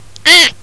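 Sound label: squeak die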